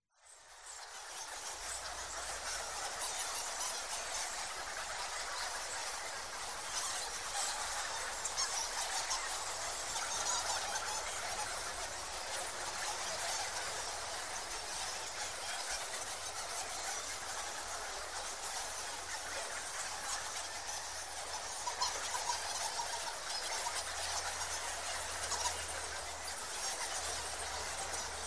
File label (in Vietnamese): doi keu trong hang